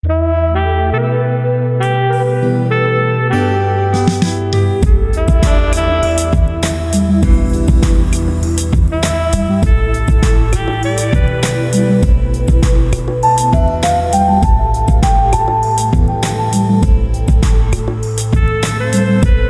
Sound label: purple sax melody lounge music